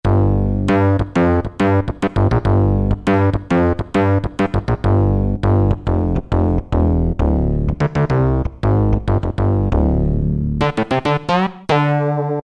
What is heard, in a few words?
BA Trad, Yamaha TG33; Factory Presets